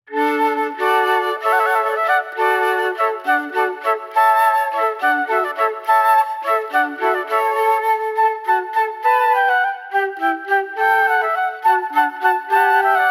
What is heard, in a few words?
Hornpipe